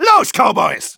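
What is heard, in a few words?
battle cry, German; Engineer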